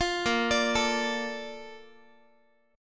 A sharp Major 7th, Guitar; arpeggiated

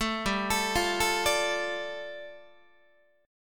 G 7th Suspended 2nd, Guitar; arpeggiated; JGuitar

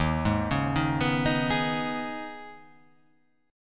D sharp 7th Suspended 4th Sharp 5th

